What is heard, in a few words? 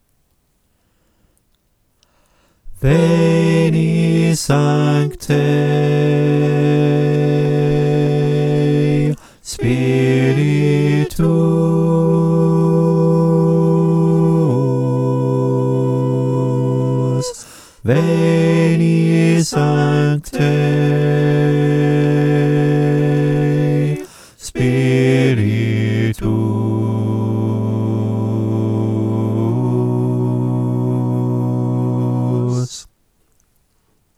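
bass, choir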